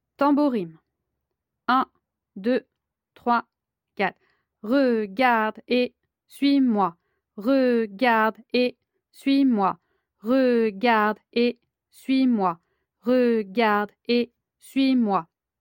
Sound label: tamborim; Les rythmes de la batucada